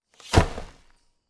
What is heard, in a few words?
EXT Dirt Shovel on Coffin